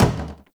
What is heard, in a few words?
Plastic Hit Short